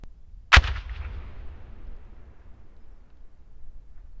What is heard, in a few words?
L109A1 HE Grenades 200m; Brecon 2015